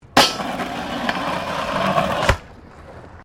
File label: Skateboard Grind; Skateboard sounds; Sports; Skateboarding